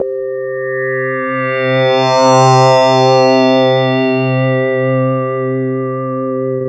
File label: SWELL; Optical Media International; Sonic Images Library; SI FX Vol 17; Instrums